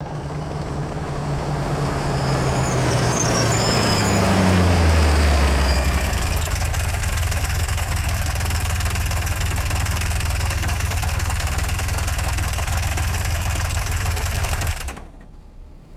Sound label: vintage car stopping with engine screech